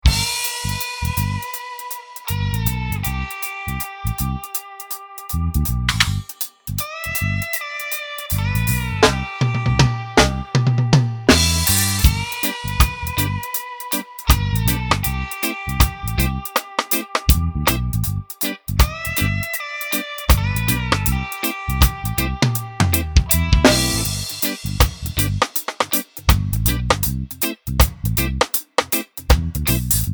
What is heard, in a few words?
drum reggae